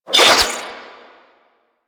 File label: Rising Blades Whoosh; Warframe; Warframes; Pax Duviricus